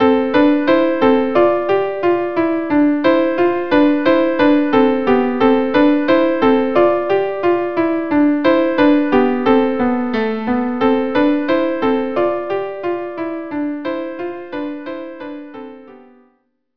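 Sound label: violin viola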